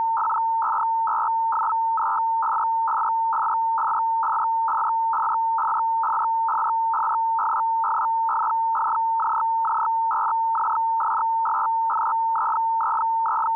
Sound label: ARQ6 90 ISS; ARQ6-90 in ISS mode; FSK-systems